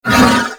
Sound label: Horse Vocal Grunt Breath Short; Vehicles; Vocals; Warframe; Duviri